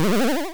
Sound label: square improvement; Square Waveform sfx